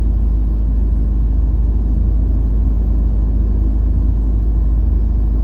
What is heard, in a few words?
loop generator